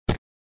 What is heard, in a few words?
cannon shot